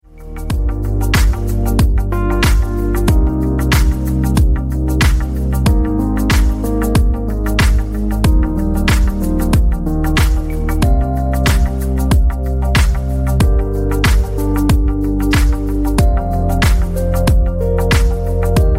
rhythmic ringtone, galaxy ringtones

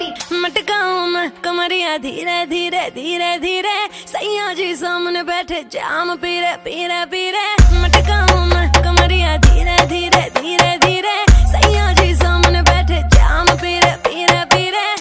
hindi ringtone

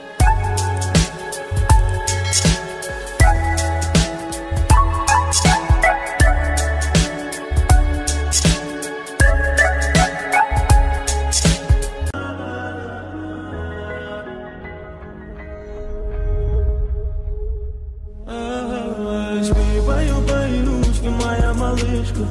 bgm remix ringtone

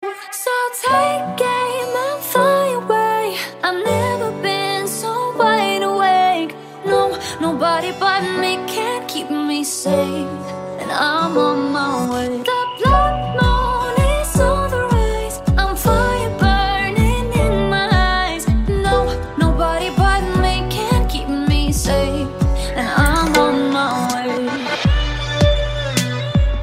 sad english song tone ringtone

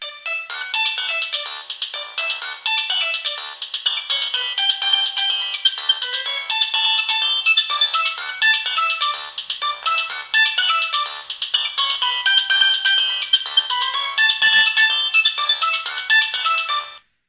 top nokia old ringtone

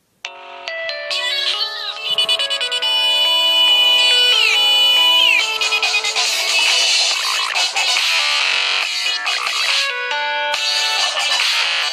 nokia lumia ringtone

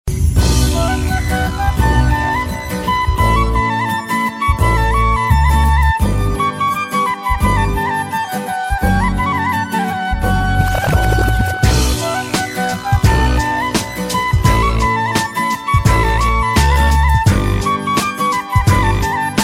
sad flute bollywood song ringtone

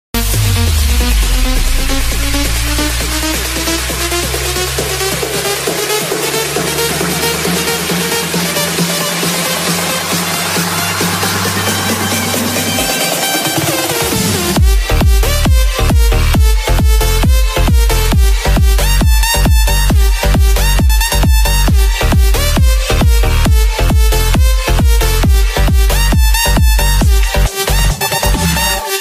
dj mix song ringtone

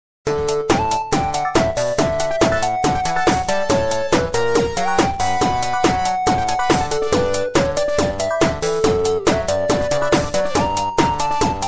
lg echo ringtone

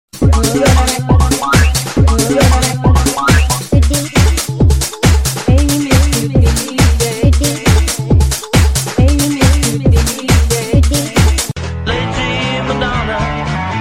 pop music mix ringtone

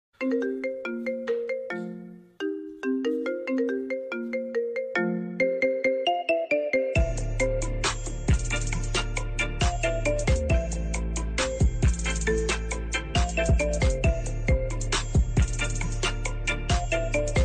remix ringtone

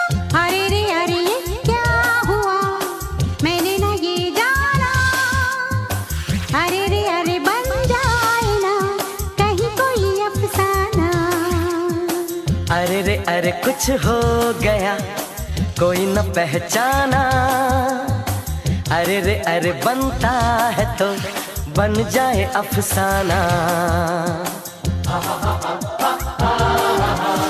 romantic ringtone, bollywood

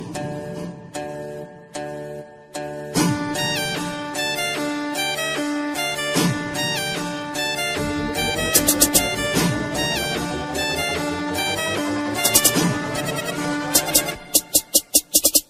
romantic music ringtone; english ringtones

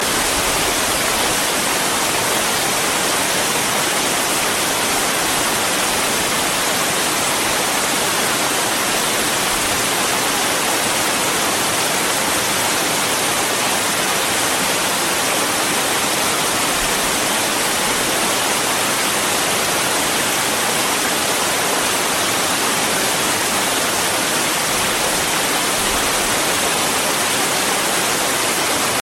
flowing water sound effect ringtone

